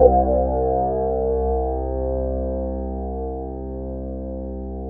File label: SYN BASS